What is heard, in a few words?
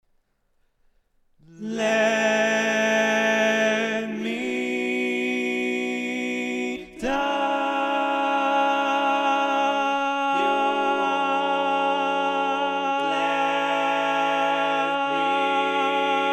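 baritone, barbershop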